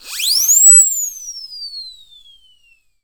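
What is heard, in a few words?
WHISTLE SIREN, Percussion; ILIO; Synclavier Percussion 2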